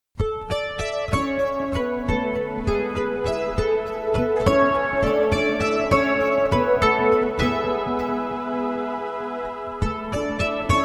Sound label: Warm Beach